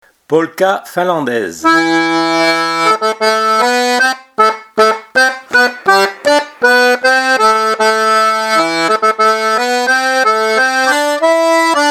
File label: Polka; Levan